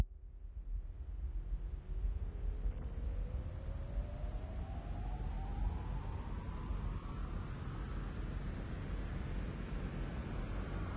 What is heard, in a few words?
Electronica noise bruitiste